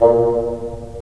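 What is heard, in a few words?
wind bassoon; LA Composer vol. 3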